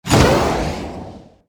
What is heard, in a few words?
superbounce pickup